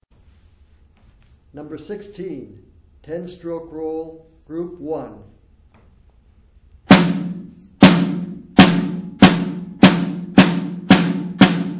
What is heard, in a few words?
snare rudiment